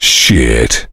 heavy pain severe; tf; duke

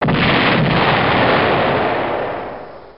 big explosion; hl2dm

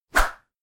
scream kill

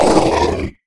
kranagull scream; kraak

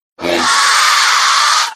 mannequin scare; kraven manor

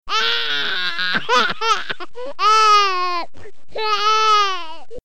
cry

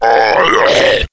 idle, slender shiver

